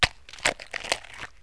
MARCH

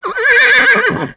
HORSE; Gizmo Project 4.0.4

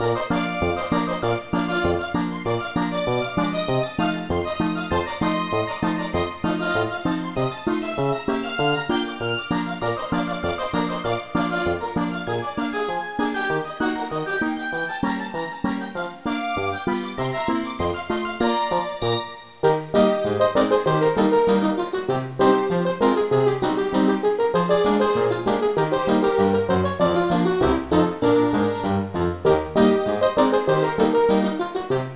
ragtim

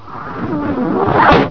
FIREBALL; Invaders! 1.2

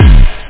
tr 909 hard mid 909 open hihat

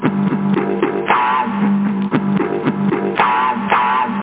beat; OctaMED SoundStudio V1; jazzies samples

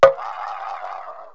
bonk whrl, cartoon accents; KABOOM! - Wild and Wacky Sound Software

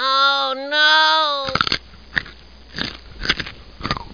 EAT GRAPE; NOAHDEMO

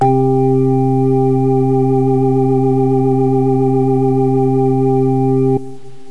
organ lesli; Terra Sound Library; construction set

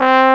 trumpet; Amiga Special - Software Hits; orchestra

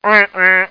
MEEP MEEP

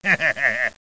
Ah Laughs; Bible Fun Pack; Education Publishing Concepts; NOAH

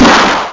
explos

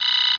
beep; TA Theme

